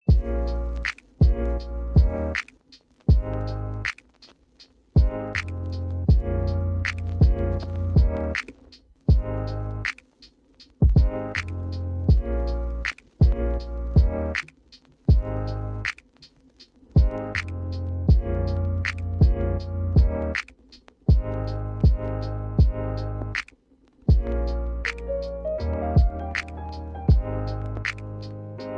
lo-fi beat

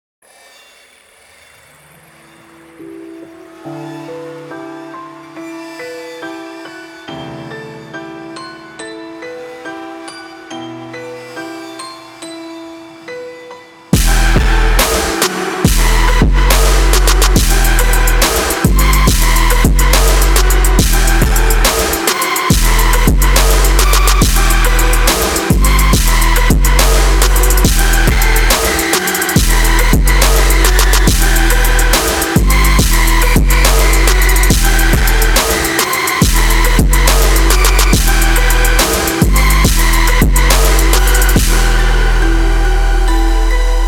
Deathstep; Russian Deathstep Community

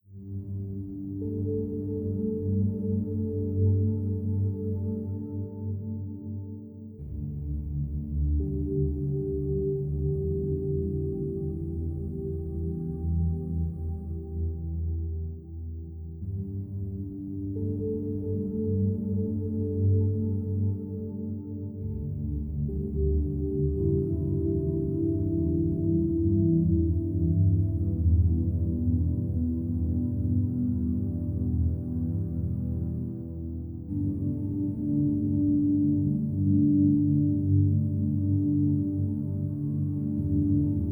Calm Seas

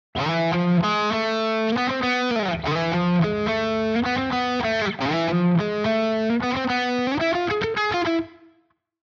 arabic melody

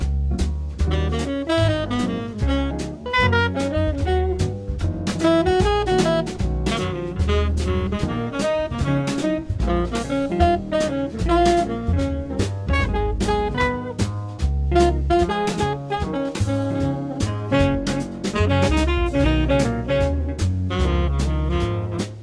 Jazz

